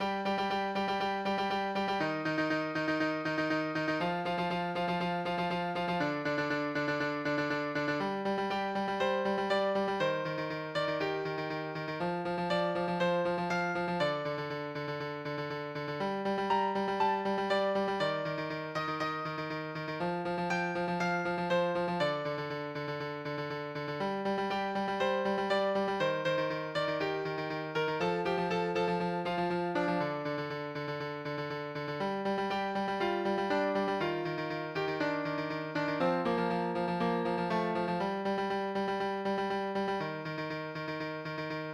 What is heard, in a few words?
piano